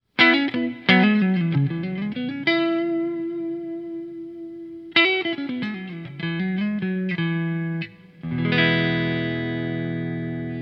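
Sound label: riverbottom neck, strats; tele; pickups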